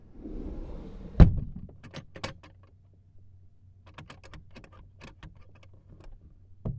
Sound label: Locked in Trunk